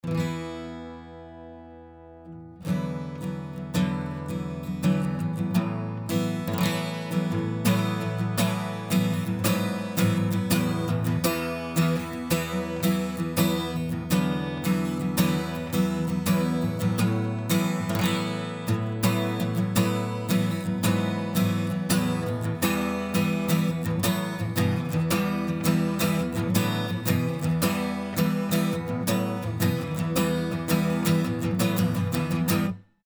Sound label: ac gtr; Hybrid F.E.T. Opto Compressor; B172A 2A Acoustic Guitar; Compressors, Black Lion Audio B172A